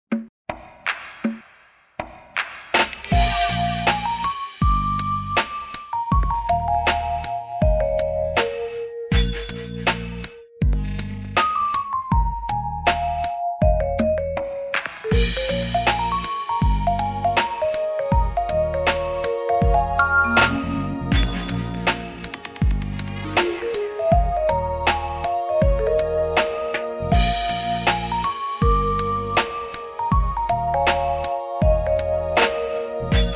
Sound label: Noisy